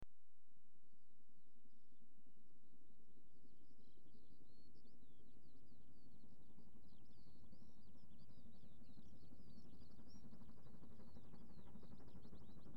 Train Coasts Past L-R With Whistl; BBC Sound Effects Library; Age Of Steam

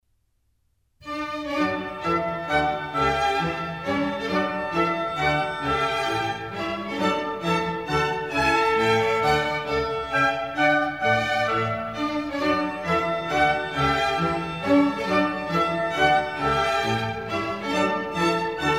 Minuets, D major; Mozart; Dances and Marches

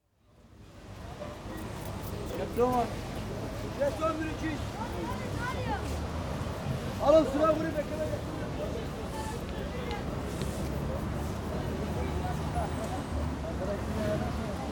Side Street, With Street-Sellers, Istanbul; The BBC Sound Effects Library

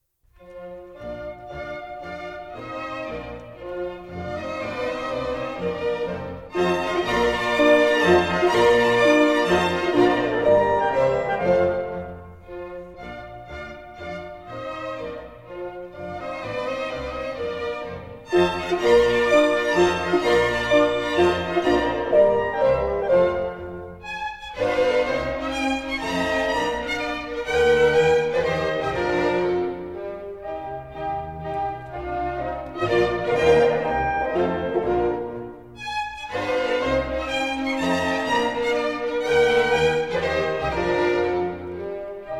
Minuets, Bb Major; Mozart; Dances and Marches

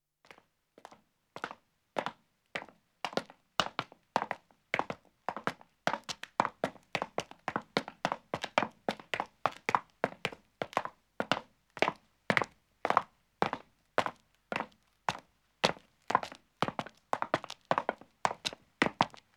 Stone Stairs (Echo) Descending; Footsteps; BBC Sound Effects Library

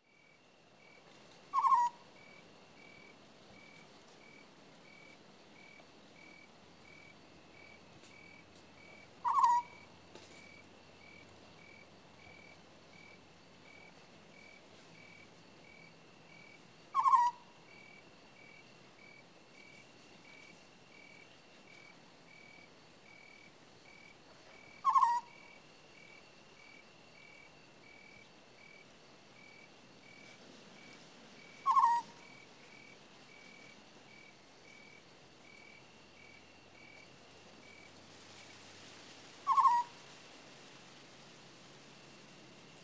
African Scops Owl; Animals & Birds; The BBC Sound Effects Library

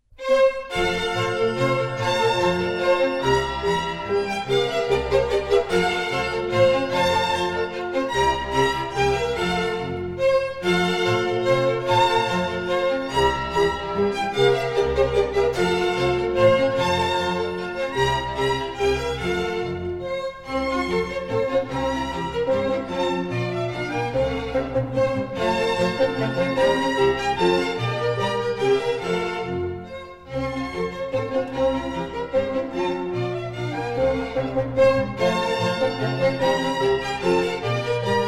Minuets, F Major; Mozart; Dances and Marches

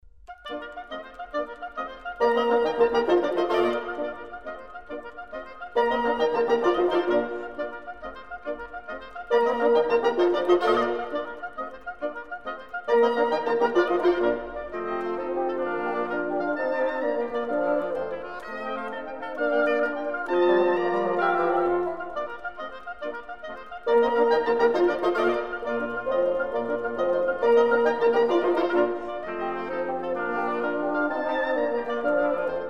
Divertimento in B flat Presto, Wind; Mozart; Serenades and Divertimenti for Wind

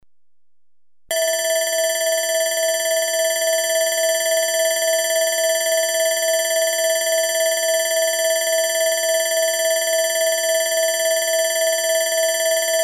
Electronic alarm bell; Sound Effects Library

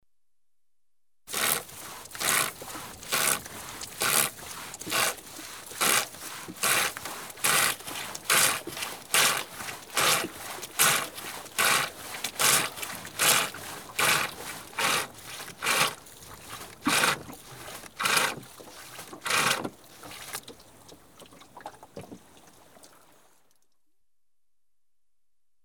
pumping water by hand pump, Ships & Boats; The BBC Sound Effects Library

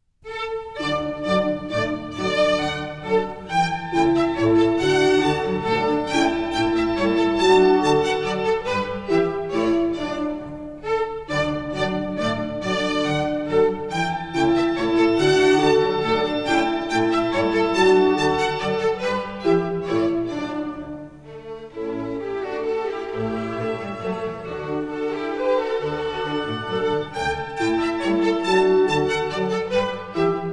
Minuets, D Major; 6 Minuets; from Michael Haydn; Mozart; Dances and Marches

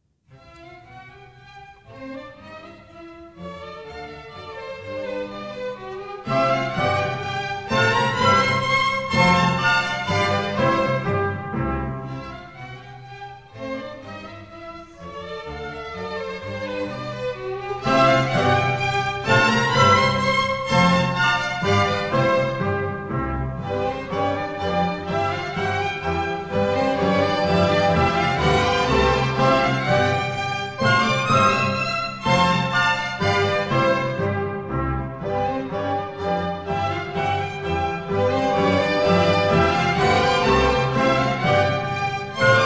German Dances, C major; Mozart; Dances and Marches

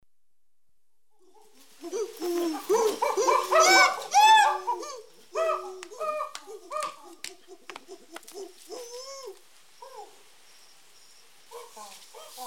CHIMPANZEES, Close up calls, Abuko Nature Reserve; The BBC Sound Effects Library; Africa- The Natural World

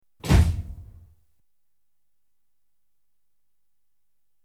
Electric Trains Carriage door; The BBC Sound Effects Library

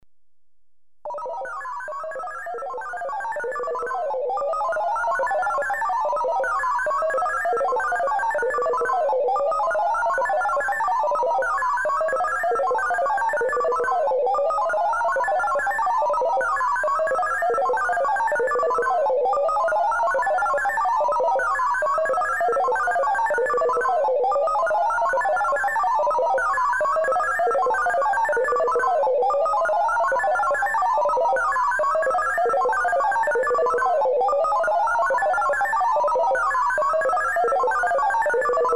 Talking computer 1 (mid pitch, harmonic); Sound Effects Library

